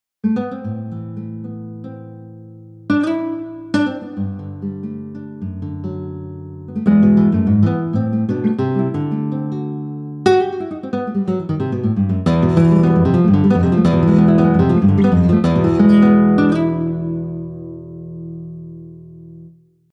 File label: classical guitar; exs24 sampler; logic pro